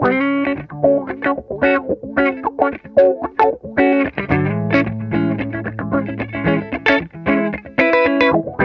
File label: MELLO, Funk Guitar; 90 s Sample CDs; Zero G